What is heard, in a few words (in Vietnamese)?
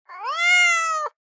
một con mèo buồn meo meo âm thanh thảm hại